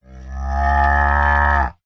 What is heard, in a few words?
animals cow moo